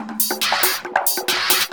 percussion loops, 138, Techno

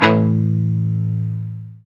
GTR Chunk, Funk; Club-50 - Foundations; Funk & Mute

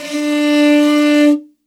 L, morin khuur, World Instruments

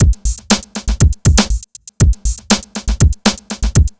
NRG Break, Trance, Drums, Breaks

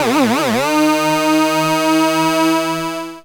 synth d#, Dance Synths, Juno; ProSamples vol.37; Juno Jam; Best Service

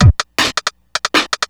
DRM, BACKGROUNDLP; Best Service ProSamples vol.49 - FX-Area; AKAI

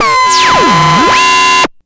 Ring Mod Noise, SFX, Electronic; Sonic Foundry (Sony Creative Software); Electronic Point Blank

